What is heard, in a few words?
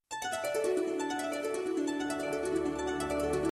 Message Melody